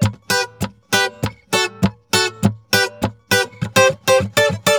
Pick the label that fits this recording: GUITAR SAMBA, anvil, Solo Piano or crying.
GUITAR SAMBA